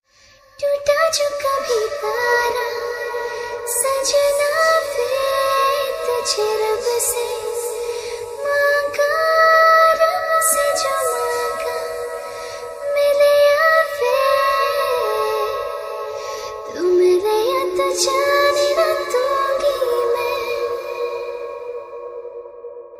slowed and reverb lofi version ringtone female musical ringtone